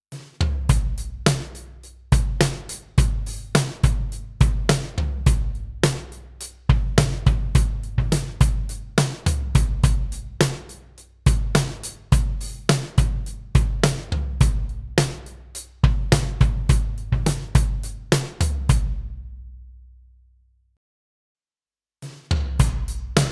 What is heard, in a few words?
Real Drums; Quantec QRS